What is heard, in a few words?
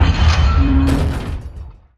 Crushing Metal; 1944 Battle Of The Bulge